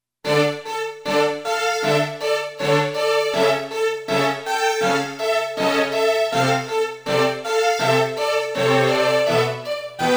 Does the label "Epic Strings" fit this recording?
Yes